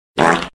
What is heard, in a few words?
small short fart